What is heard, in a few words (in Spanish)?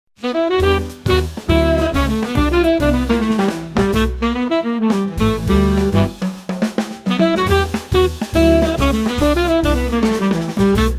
Jazz, Generado con I.A.